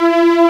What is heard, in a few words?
Game Over, SNES; Super Bomberman 4